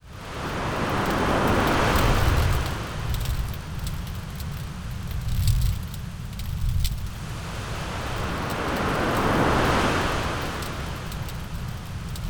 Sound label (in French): feu Plage